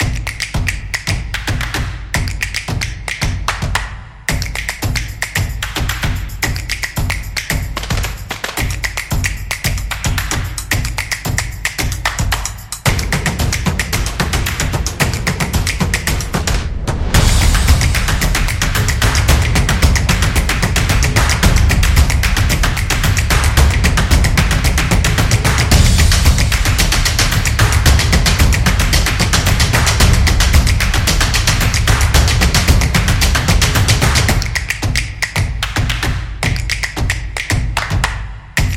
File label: Sports Drums